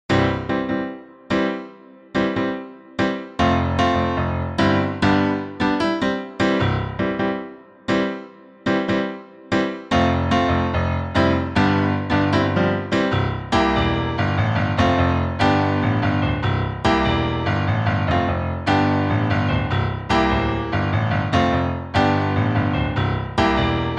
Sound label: Alto Key Accompaniment